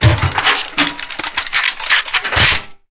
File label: reload cannon 20mm